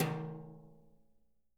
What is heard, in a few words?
BRUSHES, Living Drums